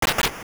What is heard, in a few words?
Mine Cart; Zelda; Oracle